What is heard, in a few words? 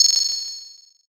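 bell; suzu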